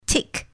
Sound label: tick